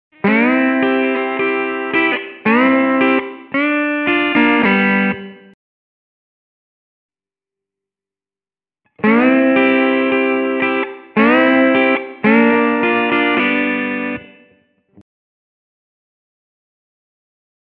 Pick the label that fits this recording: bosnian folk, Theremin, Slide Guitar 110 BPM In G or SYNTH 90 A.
Slide Guitar 110 BPM In G